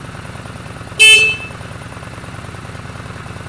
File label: horn, motorcycle